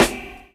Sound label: SD TONE; E-Lab; X Static Goldmine 2; akai